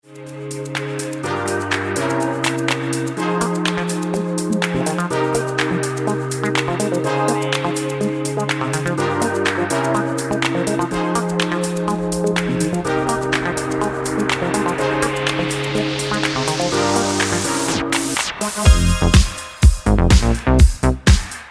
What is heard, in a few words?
instrumental remix